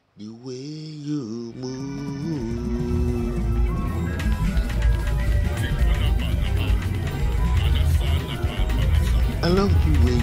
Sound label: Soft Cipher Sfx; Bazehits